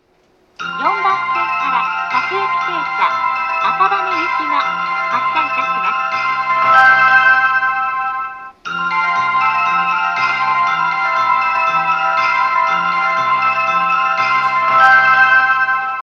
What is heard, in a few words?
shinjuku melody, Tokyo Train Tunes; japan